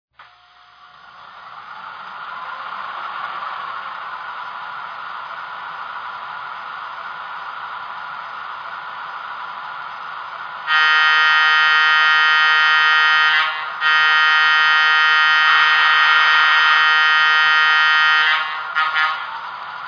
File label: GG1